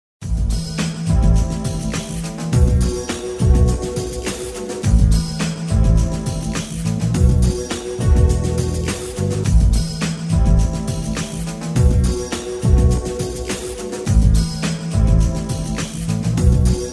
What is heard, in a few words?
smooth jazz jam